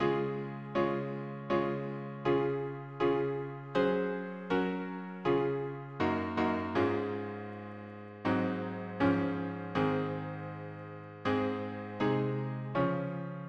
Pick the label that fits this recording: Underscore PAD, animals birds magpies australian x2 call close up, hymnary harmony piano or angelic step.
hymnary harmony piano